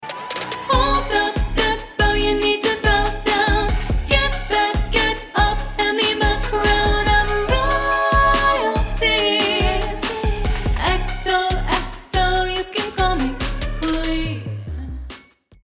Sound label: Sample, female singers